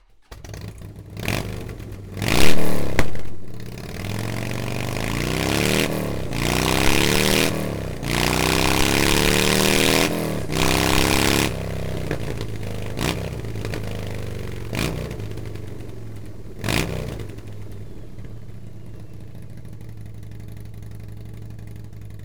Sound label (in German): Softail Open Linie ohne dB-Eater